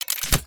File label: p atk chngun